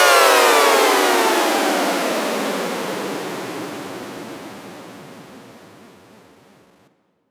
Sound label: Tonal Downlifter